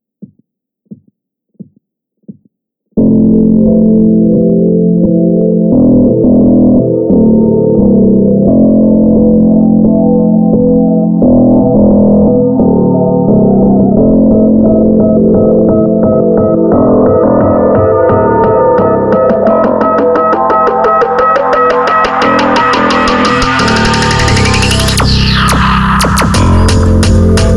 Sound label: Reverberation, スローテンポ